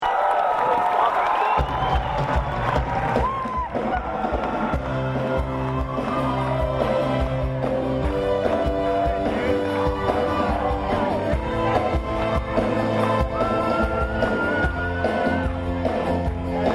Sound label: Ring The Bells